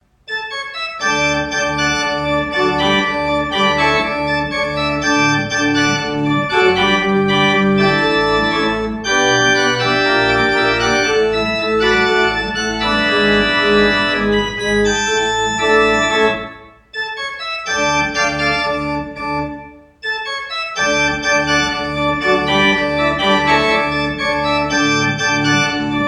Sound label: Klais, pipe organ